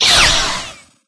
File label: hit hard; sinfar haks